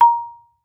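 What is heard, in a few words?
Marimba rubber fortissimo Bb5; MIS Pitches - 2014; Percussion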